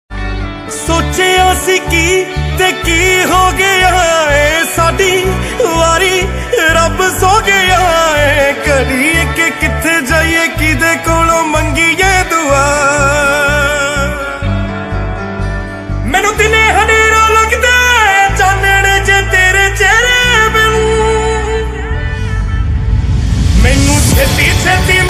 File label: punjabi ringtone